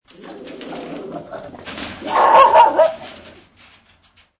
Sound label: Vicious Dog; Vicious mean dog barking and growling behind a metal gate - variation 2; Dogs; Animals